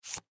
Wallet Pull Card, Wallet Leather Pull Credit Card Out; Rustling Sound Effects; Objects; Foley